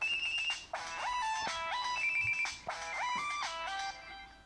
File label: samsung galaxy y ringtones